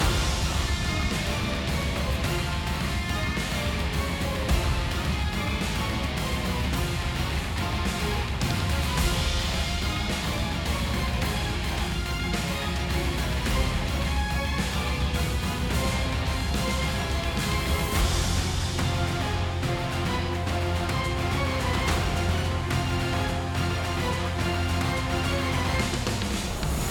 Battle Boss Common Phase

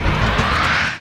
Wings Opening; blastmorph pinball; xbox series xs

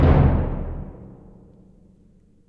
B Drum

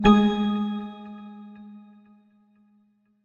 Jingles PING; Semblance

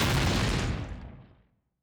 Hit Rock Damage

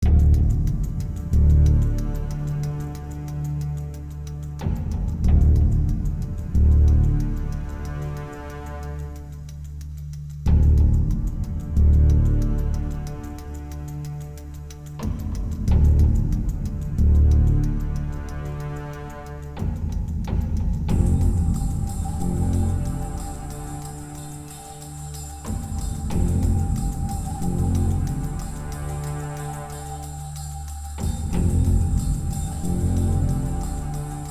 Alarming News no Arp no Pulse; Revulsion

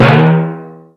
bounce, hot potato

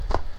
Click; Dr Driving; android ios mobile gamerip 2013

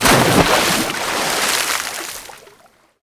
Splash; pirates of the atlantic windows gamerip 2006

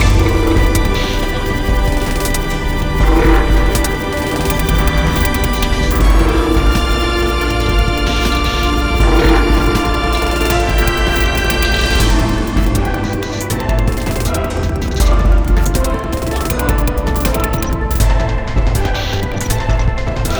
Steelworks Medium; rive wreck hack die retry; ps4

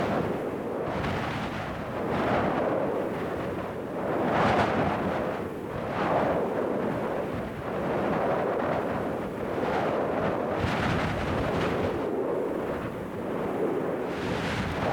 Air Turbulence Layer; OlliOlli2: Welcome to Olliwood; ps vita gamerip 2015

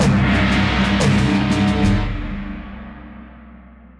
Dramatic Event Bosque

